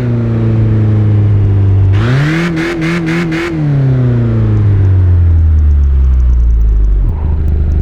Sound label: slowdown slow, vehicles cars; lwcars